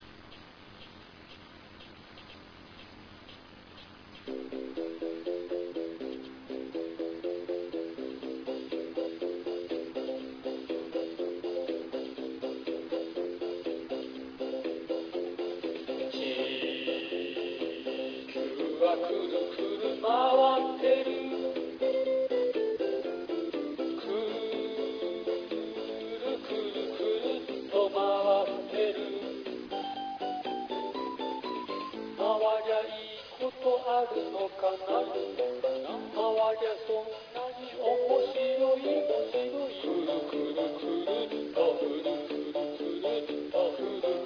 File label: taiko